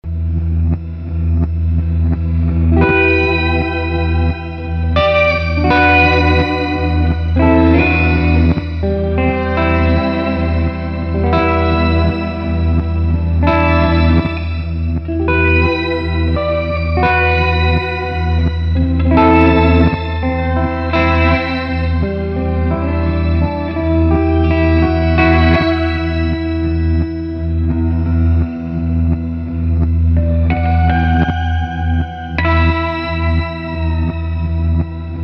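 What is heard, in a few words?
Reverse Loop Ambient Delay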